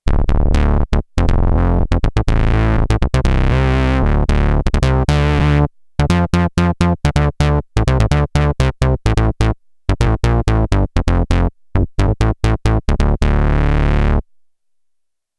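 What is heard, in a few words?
Moog Bass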